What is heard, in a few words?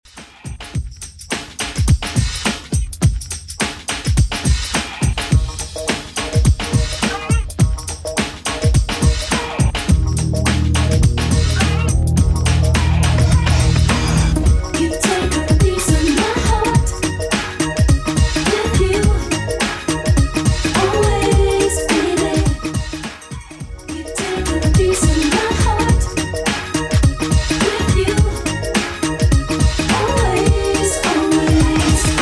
90s Redrum 105bpm